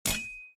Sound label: sword Metal